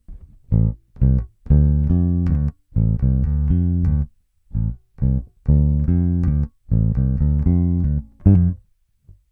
manche; schecter extreme 4

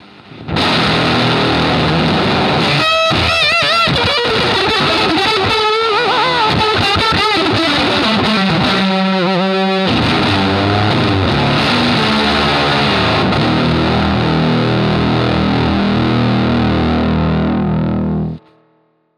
bassman avec fuzz octav; ik multimedia; amplitube hendrix

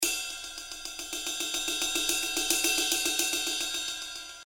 ride velocite; toontrack; ezdrummer

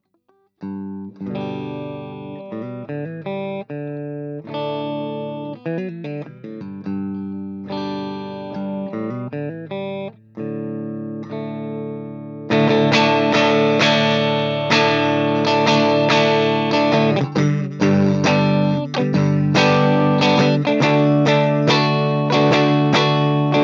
clean 15 watts intermediaire; orange tiny terror

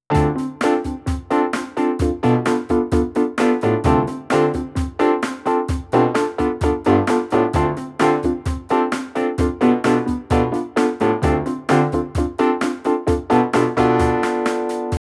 son wurly; korg M50 61; workstation

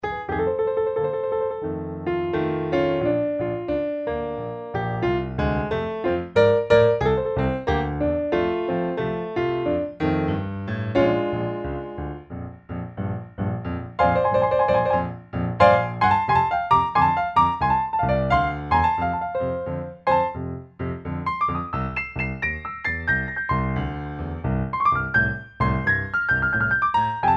steinway, piano acoustique virtuel; best service; Galaxy II Grand Piano Collection; galaxy piano 2

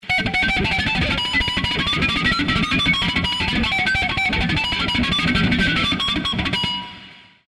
pluie harmoniques, guitare

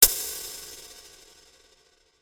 5 secondes, réverbération acoustique

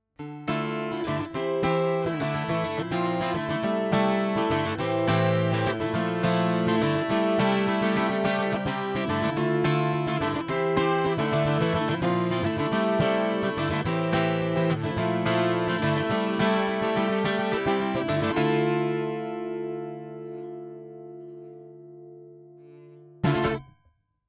bouzouki di et mic di; audient mico

